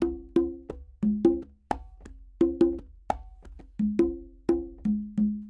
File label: NeoSoul Congas; R&B Funk Soul; Digidesign; Pro Tools; Pro Tools Essential Demos; Essential Loop Demos; Demo Session